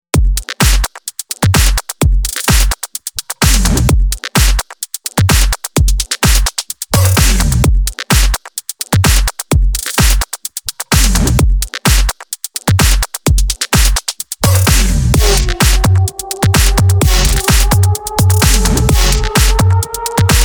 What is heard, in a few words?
bpm 128